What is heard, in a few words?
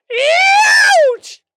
Scream; Tom And Jerry